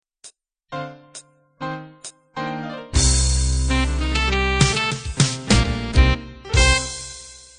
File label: Cool Jazz End